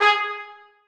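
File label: trumpets staccato g3; Sonatina Symphonic Orchestra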